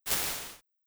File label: Gated Snare, Impulse Responses, Gated Reverbs; Small Spaces; Apple